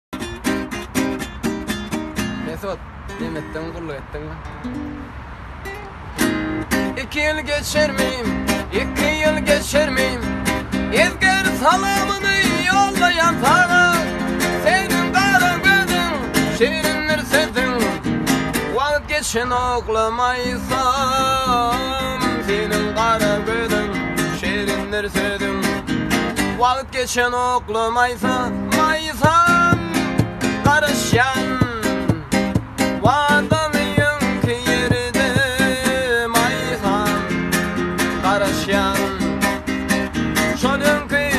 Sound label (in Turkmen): Turkmen gitara aydym